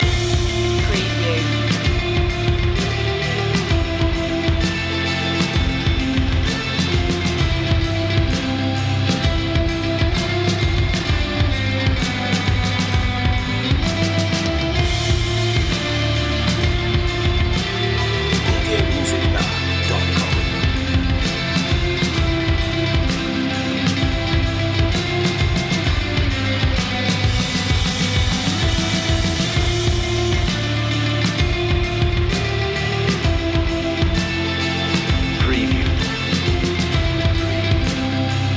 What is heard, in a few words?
slow loop